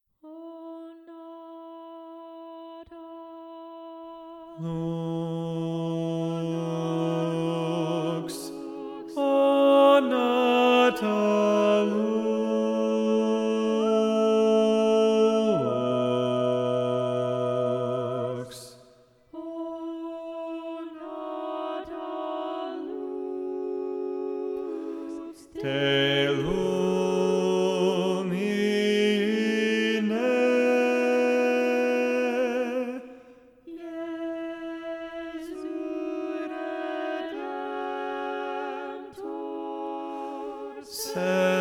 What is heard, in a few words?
Bass; O Nata Lux; Practice Room